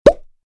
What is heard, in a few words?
button; BingoGrassland